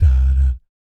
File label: BS DADAT, Vocal Jazz FX; Spectrasonics; Vocal Planet; JAZZCOMBO